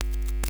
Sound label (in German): KNISTER; Xsample VOL-9; NOISE